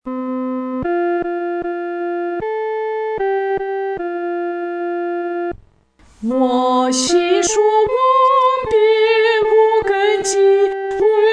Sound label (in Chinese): 独唱（第一声）; 坚固磐石